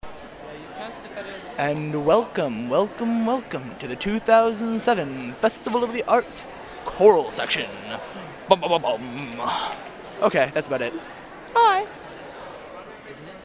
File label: choral